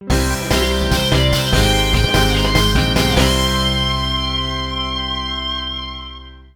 other jingles; Virtua Quest

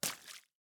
water land; teardown; ps5 windows xbox series xs

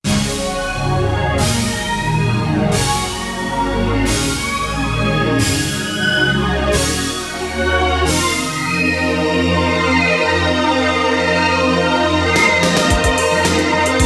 Japanese Traditional Techno Mix; Marshall Law; Tekken2

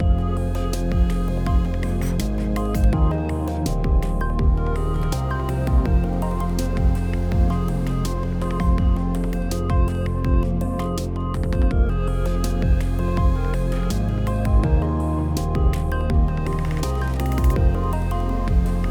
Vive Bonus 8CH 82BPM

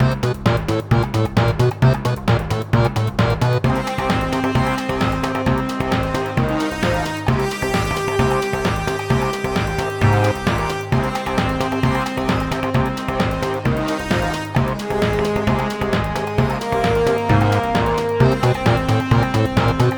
boss battle music loop

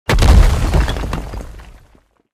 Falling Statue

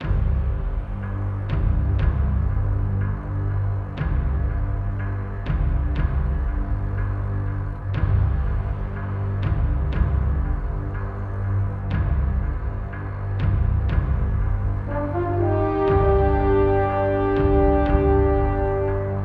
Airport Intro Stinger

